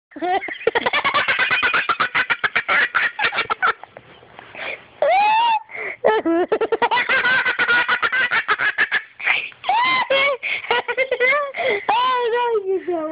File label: laughing